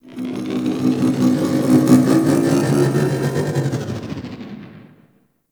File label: Grungy Drone Passby; Ambience; Warframe; Corpus Railjack